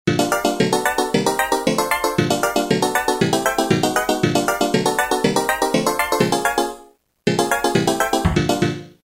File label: Arpeggio; Roland M-DC1